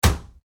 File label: Foley Medicine Cabinet Door Close; Cabinet Doors; SFX Bible